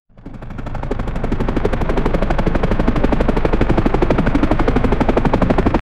helicopter sound

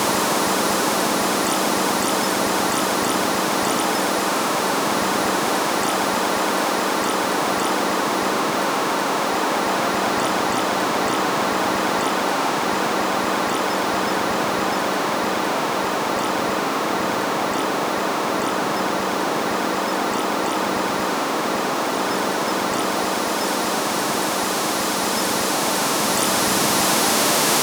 autumn wind noise

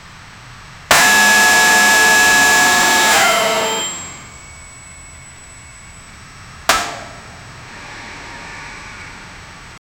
construction

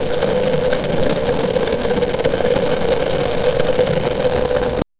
unfolding wraps fabric

